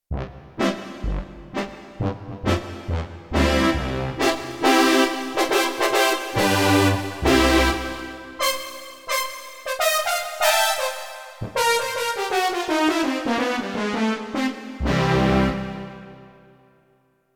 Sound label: Roland D-70 Synth Bones; Factory Performances